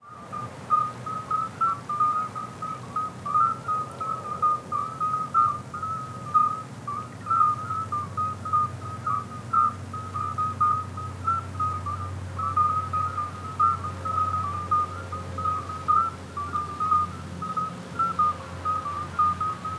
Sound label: Stochastic Frogs